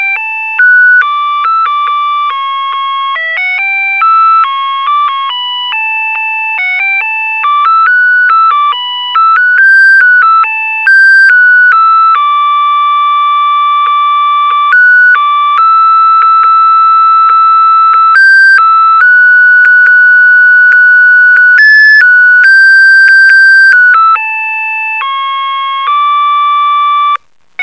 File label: Melody song